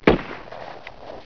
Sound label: RIFFLE; Macworld (1998-04); Paper Air Force LE